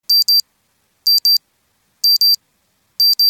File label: watch alarm